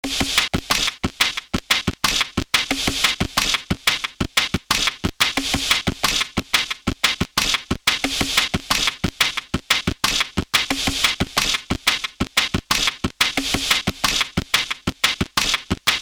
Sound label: drums tube more control